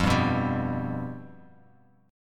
F Suspended 2nd Flat 5th, Bass Standard; strummed; 13,10,9,12; JGuitar

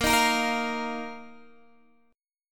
A sharp Suspended 4th A sharp down Guitar DADGAD

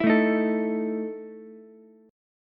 E Major 7th Suspended 4th, Ukulele; strummed; JGuitar

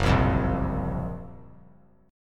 Gb Major 7th Flat 5th; GbM7b5 strummed; JGuitar

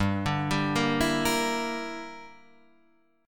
G Minor 6th, Guitar Standard; Gm6 arpeggiated; JGuitar

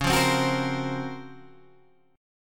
Db Major 13th, Guitar Open G

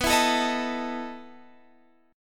E Major 7th Suspended 4th, B

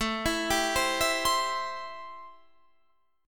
C 6th A, chord

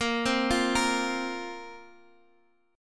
A sharp Suspended 2nd down Guitar Half Step Down

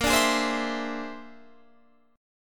Bb 9th Flat 5th Bb, chord

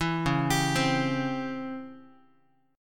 Db Minor Major 7th Flat 5th Db down Guitar; DbmM7b5 arpeggiated; JGuitar

